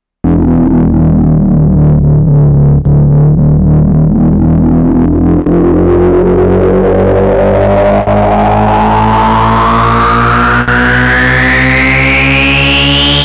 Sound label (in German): Korg Volca Bass LFO auf Cutoff plus Pitch